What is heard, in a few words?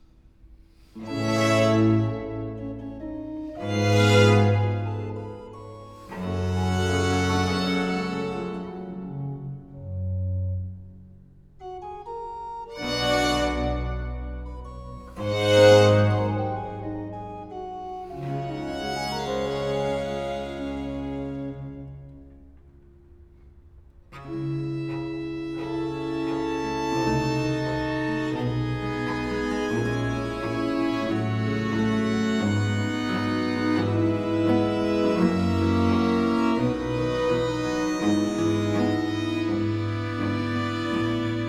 Concerto No. 7 in F Major - Adagio; Vivaldi - L'Estro Armonico; Rachel Podger & Brecon Baroque